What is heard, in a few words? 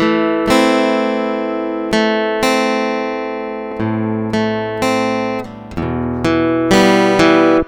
Guitar 125 BPM (A Minor), Acoustic Guitar; Freeways; GhostHack; Free Guitar And Piano Samples